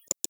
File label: mouse